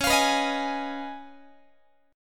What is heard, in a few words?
C sharp 9th C sharp down Guitar, chord; C#9; strummed; JGuitar